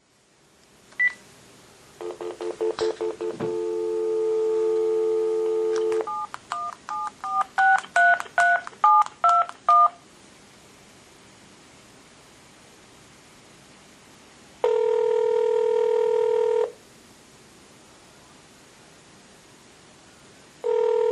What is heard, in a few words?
Phone Call